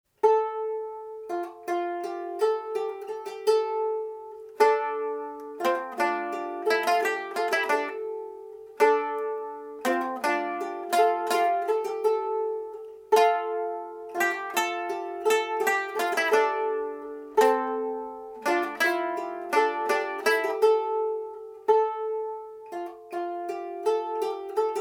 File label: rota ground bass melody